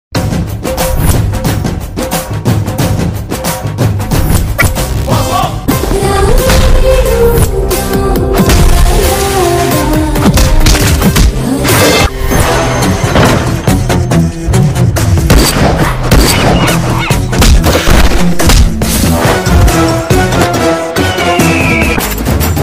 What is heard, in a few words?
trailer bgm ringtone; Gully Rowdy